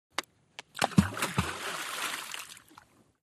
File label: Splash Boulder Rocks